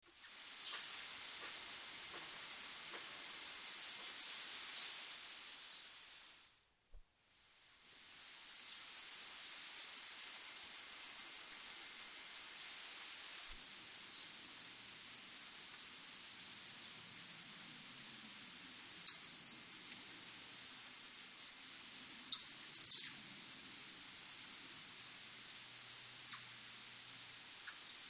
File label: golden headed lion tamarin; Atlantic rainforest, Brazil